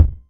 Roland MC09 Kick

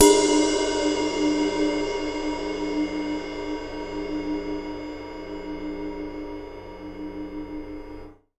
RIDE22 BRUSH; Big Fish Audio; Ross Garfield Drums VOL-2

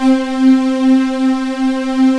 LUSH STRING; Pro-Rec - Synthsonic; Z1 PADS

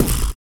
SLOBBER; Spectrasonics; Planet

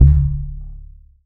TUB BASS D 1

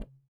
THROAT; Spectrasonics; Metamorphosis; THROATERS

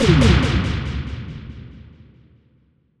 Fall, Hits & Falls; Zero-G Trance Inducer

